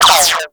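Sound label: Gatling Gun Projectile By; Warframe; Tau Gatling Gun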